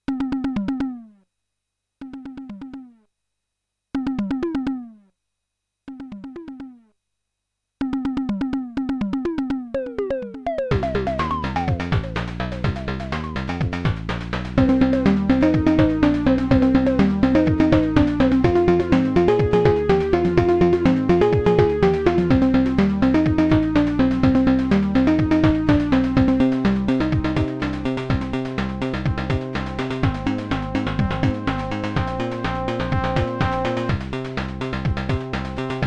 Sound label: Tom Tom Jam; noigesoft; Echoes